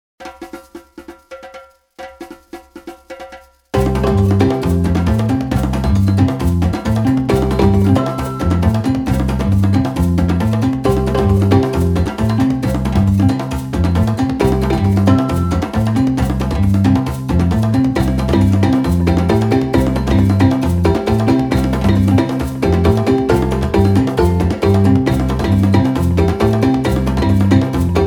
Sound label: african drums